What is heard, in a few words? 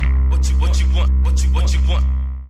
wachiwachiwah